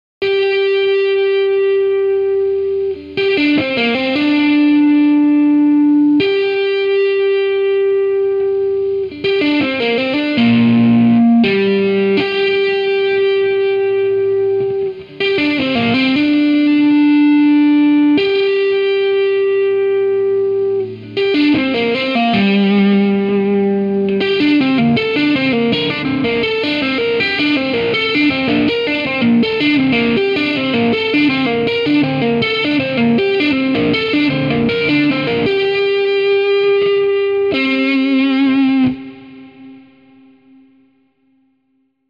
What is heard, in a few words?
les paul amplif; chiqui audio